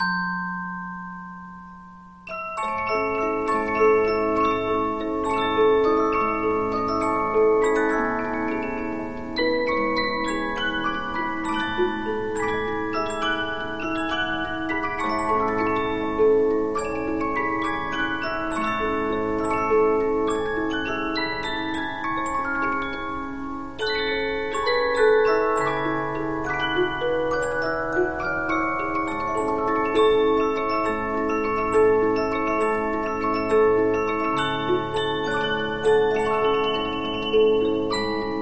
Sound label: Polyphon, 15inch disc musicbox